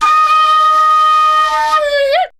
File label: FLU + VOC, FLUTE + VOCAL; Best Service; World Colours